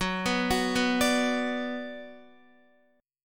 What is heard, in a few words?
Gb Suspended 4th Sharp 5th Gb, down, Guitar; arpeggiated; JGuitar